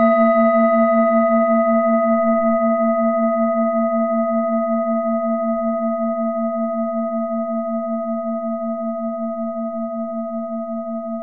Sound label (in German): assam planetentonschale